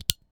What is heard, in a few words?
Click; flashkit; Beatsuit